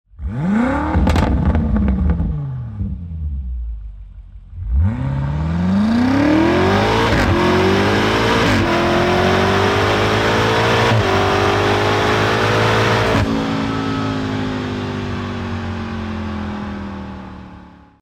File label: BMW M5